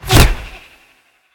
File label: semiauto; smite; Anhur